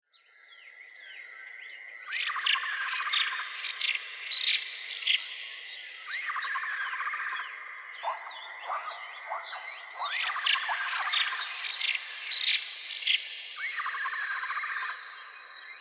Horror Enchanted Forest Active Birds Insects Ambience; Scary Soundscapes